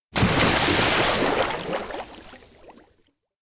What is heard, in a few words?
lake dive fast; anemoi